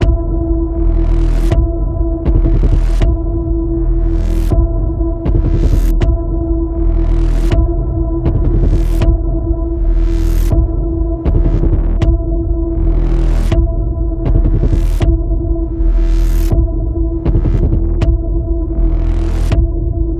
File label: Ominous Chase Panning Pulse E, Key of E